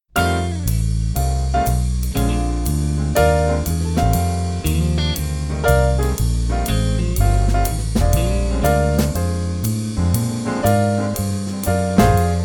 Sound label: Road Blues, Bass; Bass Unlimited